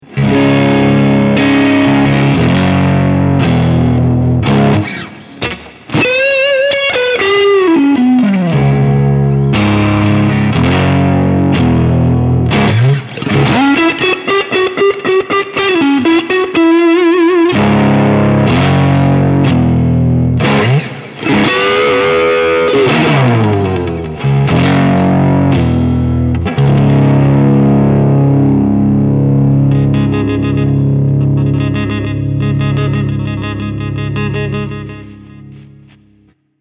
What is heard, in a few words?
Gas Station more gain; fender